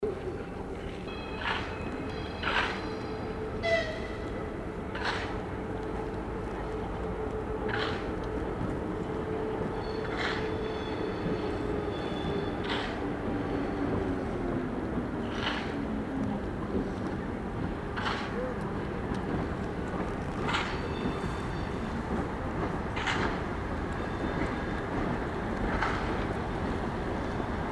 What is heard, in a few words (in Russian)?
fon stereo